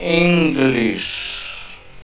VOC, Drum & Bass, 130 BPM; Ueberschall; Drum & Bass Flash Trackz

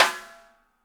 DW Green Maple Timbali; Sonic Foundry (Sony Creative Software); Vital Drums

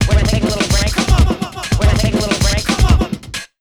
RUN 140, Big Beat Drumloops; AKAI

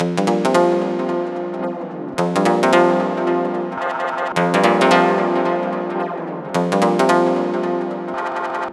110 Fm, Melodic Loops; M8; Electronisounds

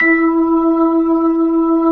B-3, Jazz

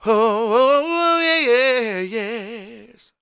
SOUL, Vocal Planet, Blues & Soul; CW SOUL

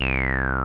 TB303; Zero G; Man Machine

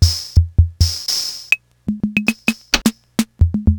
Conn Min-O-Matic Rhythm

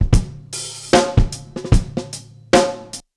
Hip hop loop 76bpm; Hip-Hop Street Beats Construction Kit; Street Beats by Poogie Bell

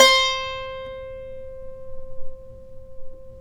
SAZ; Spectrasonics; Hans Zimmer Guitars VOL-2

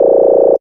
SYN FX; X-Static Goldmine 1

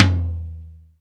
HI EDGE DRUM

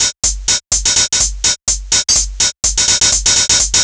drum loop hat 125, Classic Chicago House; Sample Magic

